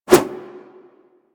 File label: flip whoosh; characters; generic foley; Warframe